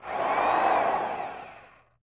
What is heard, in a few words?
drill, dark matter mining